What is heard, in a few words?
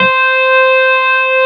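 Wurlitzer C4 - L; USB Soundscan vol.09; AKAI; Keyboards Old School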